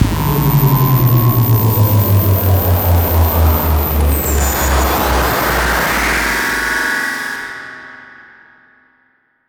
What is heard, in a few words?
Last Battle Start; Vritra